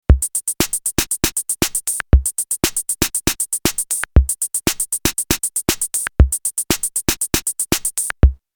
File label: EMW T-Drum 70 Kit